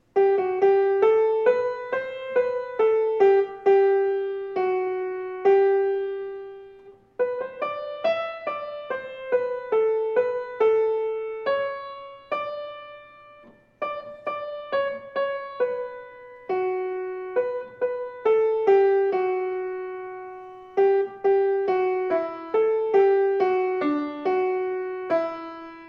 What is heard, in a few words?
Fortunatus MELODY